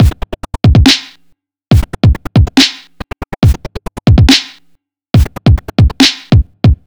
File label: Gated Beat, 140 bpm